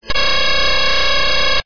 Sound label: digital noise generator circuit